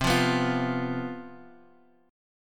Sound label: C Major 7th Suspended 4th, down, Guitar, Open G